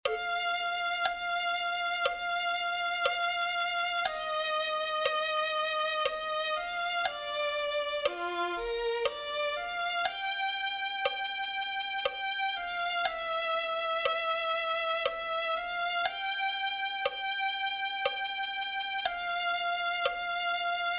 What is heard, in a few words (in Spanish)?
violin1